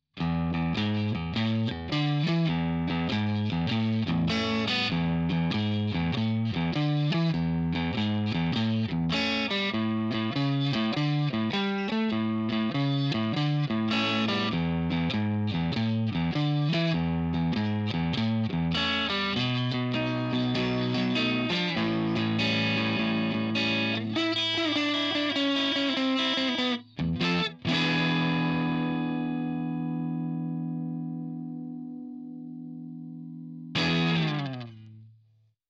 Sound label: Chevy Neck Clean; Gitarrenvergleich